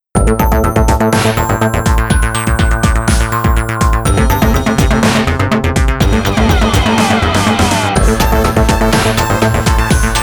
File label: Bells 123